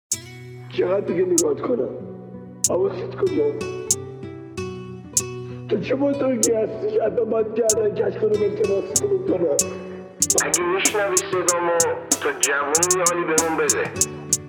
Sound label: remix